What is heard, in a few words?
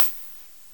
HI HAT 2 CLOSED 2; KillerTweaksII; DirectWave